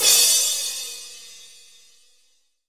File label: Dance Drums crash R; Dance Mega Drums; Best Service; CRASHES